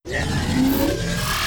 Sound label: Launcher Charge Build Up; FPS; Weapons; FirstMicroGame